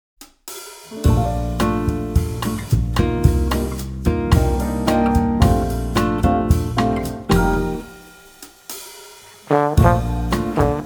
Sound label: Bossa Nova Ver.; Missing 9; Korea